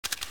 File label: zoom; csco; csgo